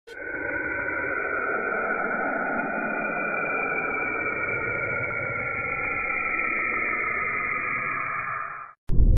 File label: scary sound weird sound of saturn